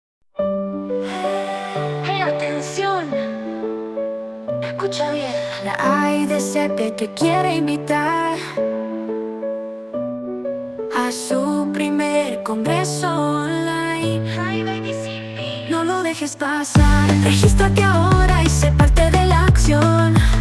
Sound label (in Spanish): Piano Chillstep; LIVE-AIDCP Invitacion